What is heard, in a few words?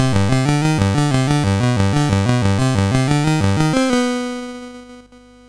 sid MOS6581R2, GAMES; Face Off